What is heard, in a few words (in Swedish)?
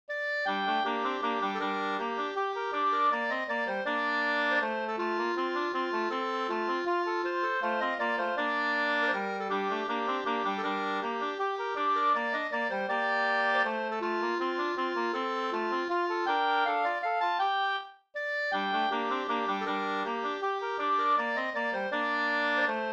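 vals Brudvals, two-part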